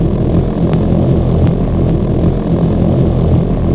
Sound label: HOVER; batlords